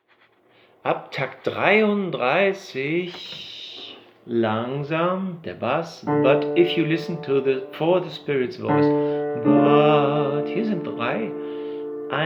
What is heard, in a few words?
Bass